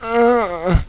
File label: pain